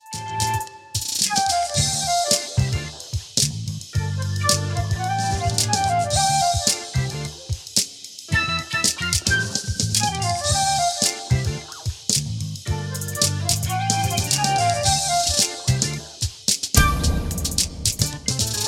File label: Flute